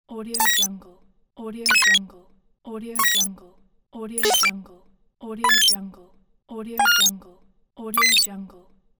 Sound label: buttons